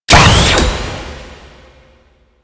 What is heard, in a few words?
Laser Disc Gun Fire; Moa Disc Laser Gun